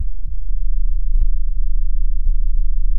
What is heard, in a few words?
a low resonant hum with, glass